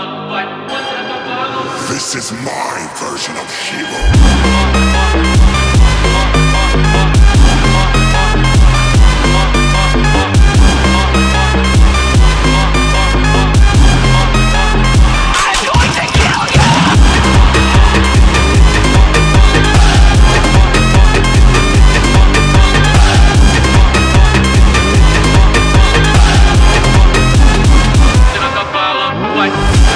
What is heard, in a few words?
funk infernal